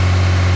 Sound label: ram rpm, Engine; Cars; XBOX